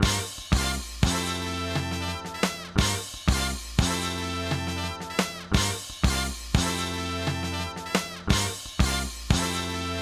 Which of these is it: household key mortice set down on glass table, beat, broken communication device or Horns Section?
Horns Section